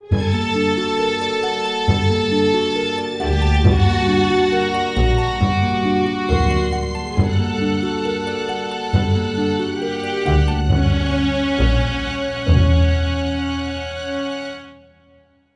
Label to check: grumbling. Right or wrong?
Wrong